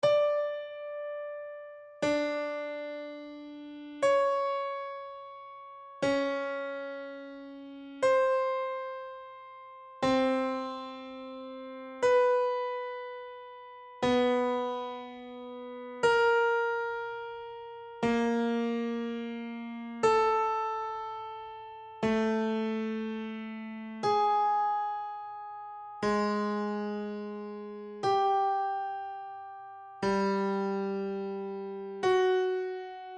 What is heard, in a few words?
descending pitches